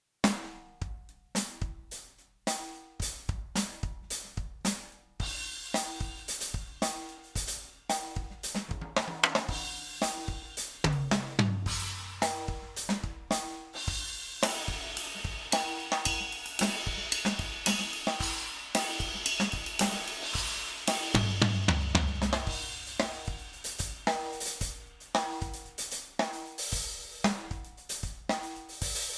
Strata Prime Hip Pop Kit; ALESIS

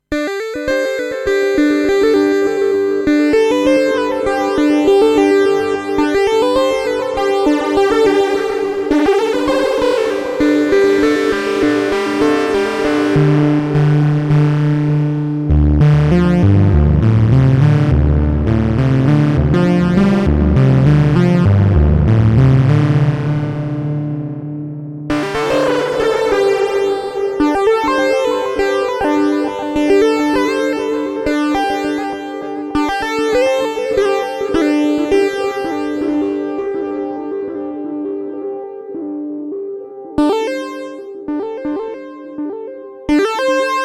Arturia Minibrute Moog MF103 Lead; Flashback X4

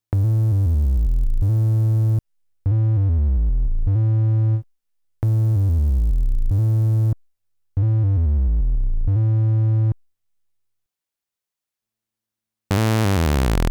Behringer MS-5 vs 921 VCO

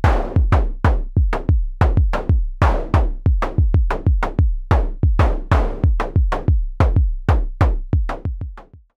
Behringer Syncussion SY 1 Groove; Mutable Grids

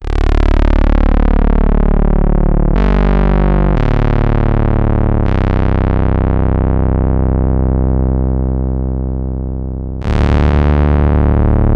ProOne Taurus3; Toro LowDown

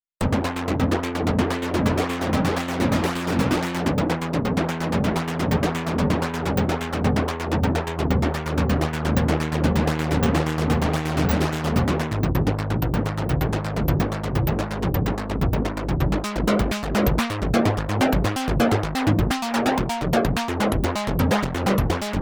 driving arp

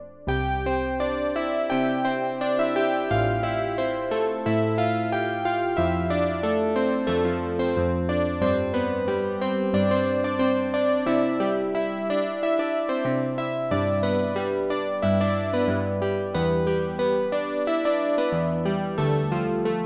Super Gemini Piano

